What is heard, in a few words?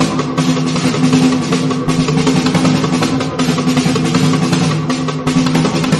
snare horde; music